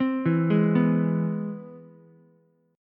C Augmented Major 7th C down Ukulele; arpeggiated; JGuitar

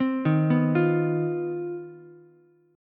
C Minor Major 11th C down Ukulele C6; CmM11 arpeggiated; JGuitar

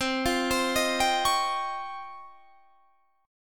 C sharp Major Sharp 11th C

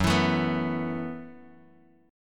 Gb Minor 11th Gb, Bass Standard, chord; strummed; JGuitar

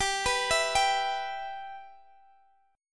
G 6th, Guitar, Drop D

